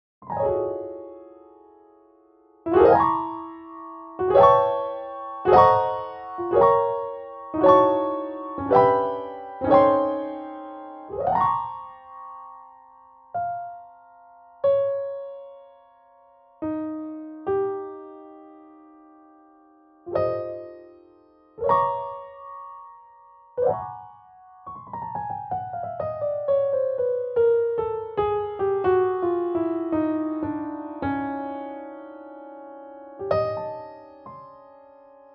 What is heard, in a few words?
Piano